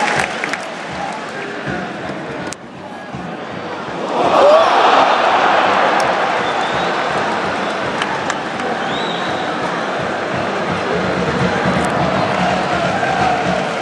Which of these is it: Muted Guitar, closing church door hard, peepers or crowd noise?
crowd noise